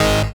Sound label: SYN TEKNO, Dance Synth; Sound & Vision - Gigapack I CD 2 (Roland)